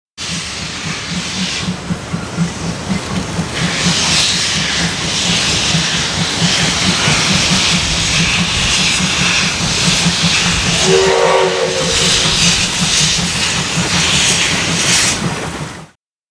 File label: SL start